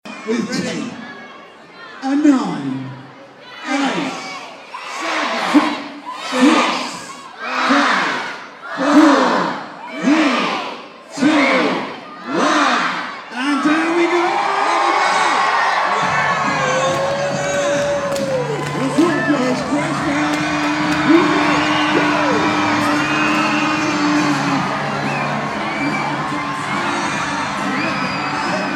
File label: Countdown and lights switch on plus audience reaction